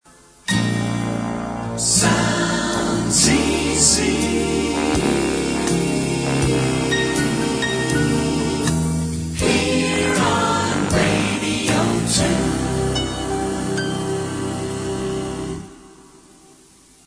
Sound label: jingle